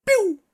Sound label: laser guns; SyrinScape; Syrinscape unplugged; Jungleplanet dinolaser battle